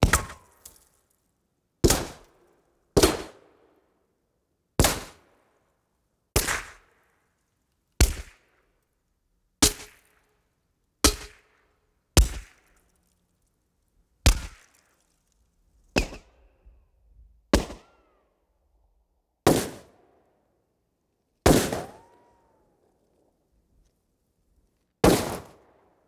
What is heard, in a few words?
Ricochets Off Dirt; Weapons & Explosions; Sony Pictures Sound Effects Series Volumes 1-10; Sony Creative Software